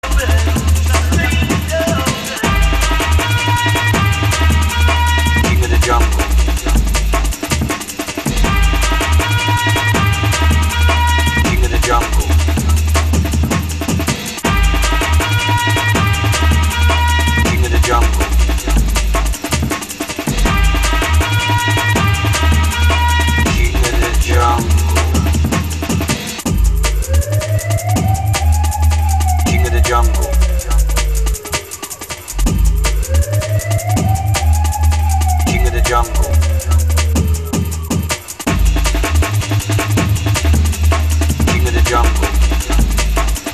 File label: 24-7 drum and bass